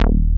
PRO1

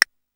DANCE SET, DRUMS

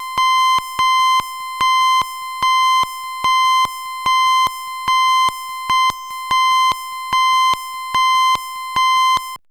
RHYTHM FM

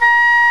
WOODWINDS

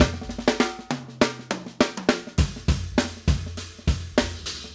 Acoustic Live Drum; Platinum Audiolab

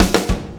BACKBONE, Backbeat

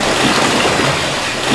WET, WATER; AKAI S6000 CD-ROM - Volume 1

